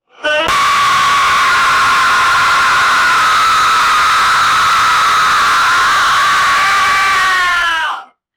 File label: monster vocal